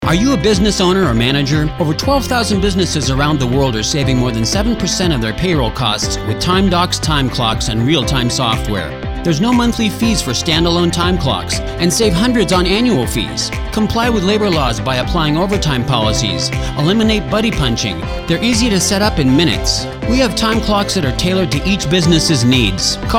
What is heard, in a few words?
Jingle; TimeDox